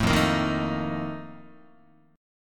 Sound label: F 11th F, down, Guitar; F11 strummed